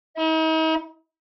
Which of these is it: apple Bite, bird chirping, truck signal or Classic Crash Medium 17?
truck signal